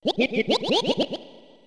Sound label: bob-omb